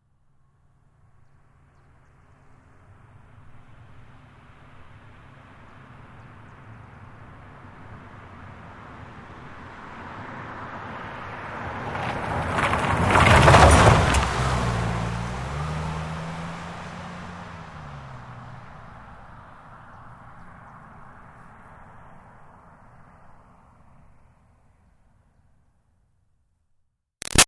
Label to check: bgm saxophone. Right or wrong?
Wrong